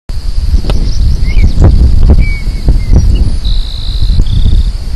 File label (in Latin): Rhynchotus rufescens; Ruta 37; Jorge La Grotteria